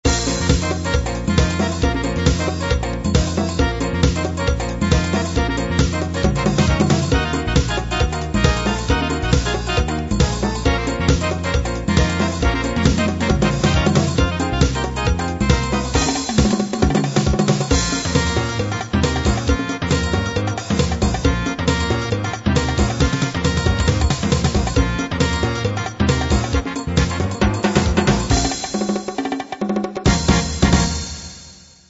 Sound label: mambo swing